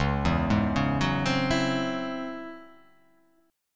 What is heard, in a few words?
C sharp Minor Major 11th C sharp, chord; C#mM11 arpeggiated; x,4,2,1,1,1,2,1; JGuitar